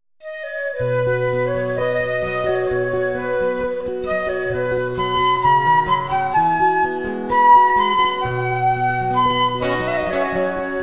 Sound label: intro flute